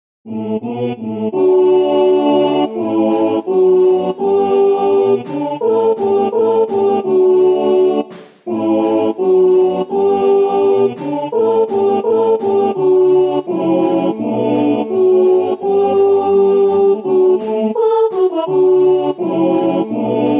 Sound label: soprano